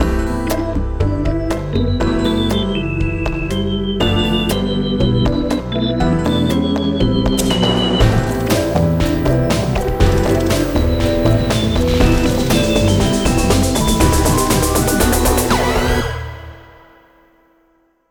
Slowly Approach

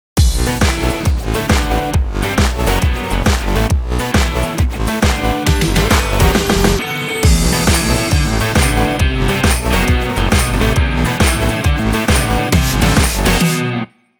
treble

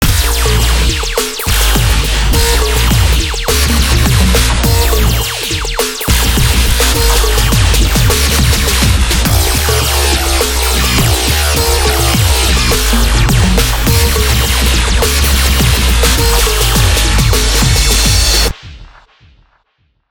electro

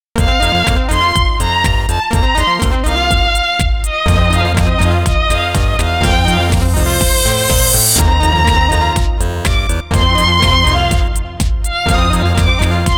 Cats Dub

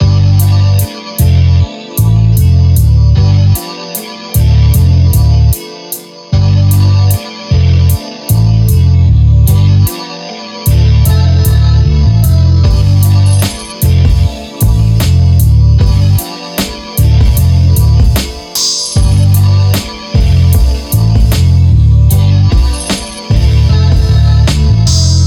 HIPHOP

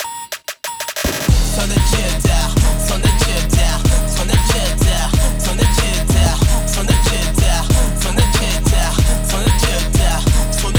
Clap

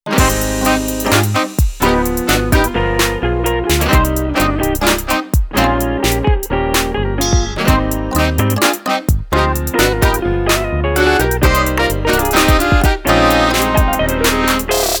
Jazzy Trap; Kazuhiro Kato

